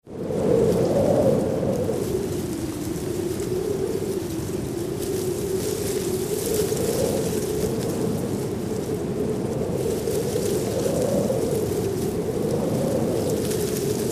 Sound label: Lht Wind Rustle Leave, trees & gusts